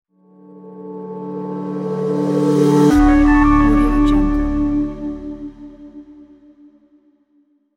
logo minimal; airfamily

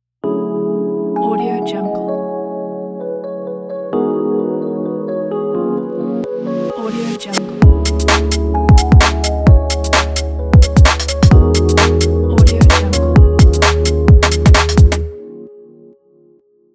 funky clean garage beat logo

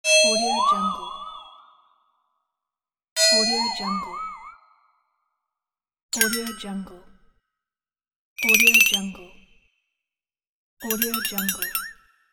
funny doink

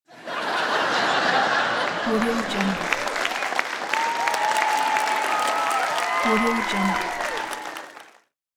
audience cheering

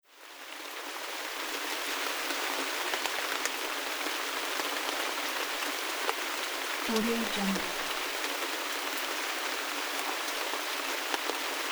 rain

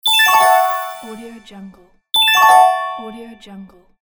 progress complete

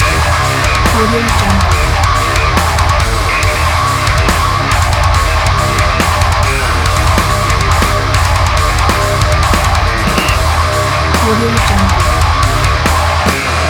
punchy metal loop

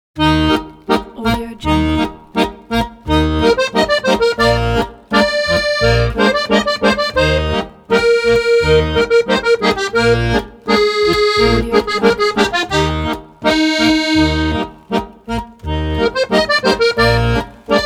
neapolitan song accordion solo; tchaikovsky

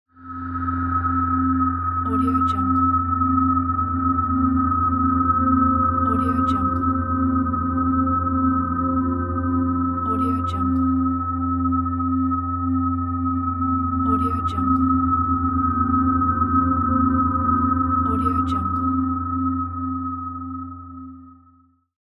background sound for space documentaries

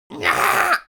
creature imp laugh

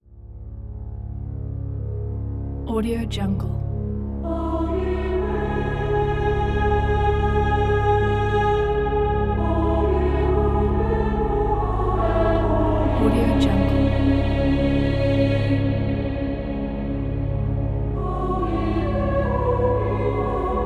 angelic choir chants

